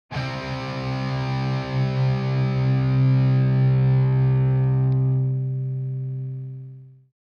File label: power chord